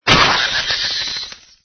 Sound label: black pumpking explodes; Angry Birds Seasons